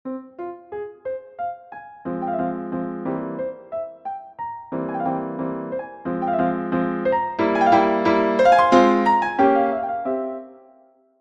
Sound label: Piano Sonata in F minor Theme